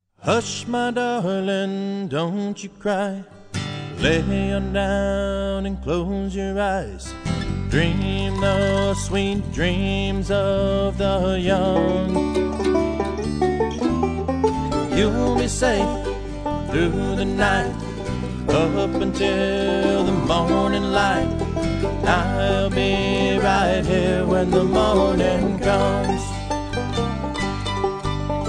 Lullaby; Texas

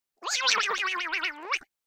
Hamster Growl

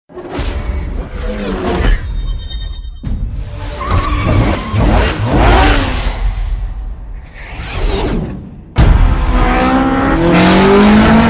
Racing

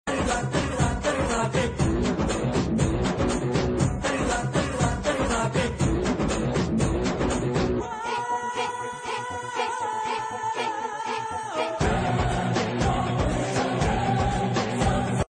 Kick

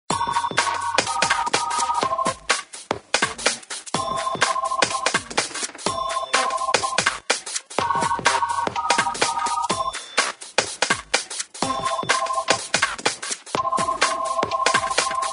New Tone

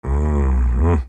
mhmm; Juggernaut

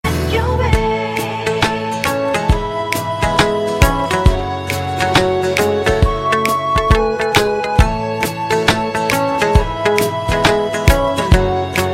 Flute Tone

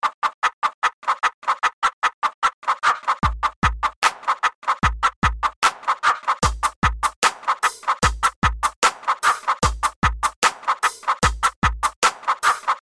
Chicken song

